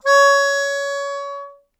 Melodica Long, Long Notes Chromatic

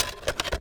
Remove, DVD Drive External; DVD Drives

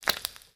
Frying Bit

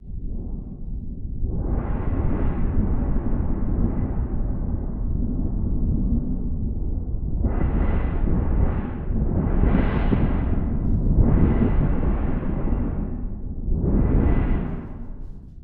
Storage Heater

